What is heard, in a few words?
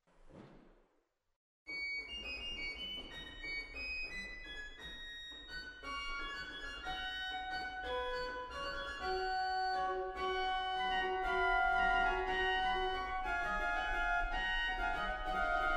Super Octava 2, organ; waltershausen